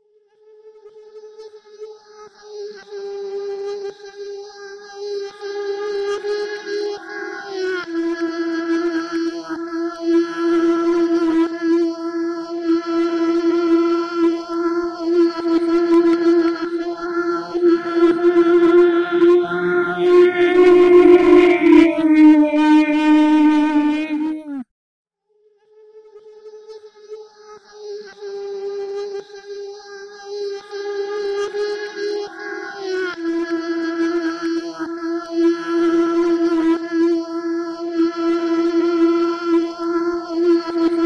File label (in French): larsen, exterieur; pascal deleuze; websynradio